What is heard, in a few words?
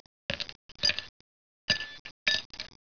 ICE GLASS; 10,000 Graphics Pack Volume 1; Media Graphics International